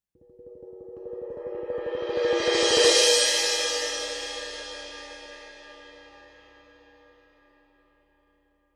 18in Classic Orchestral Selection Suspended, cymbal sound